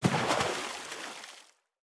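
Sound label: Splash Medium; boomerang fu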